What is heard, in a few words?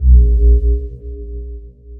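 Alert Low; FREE SFX PACK VOL 2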